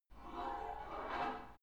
take off light weight barbell soft reverby, fitnessstudio; sport freizeit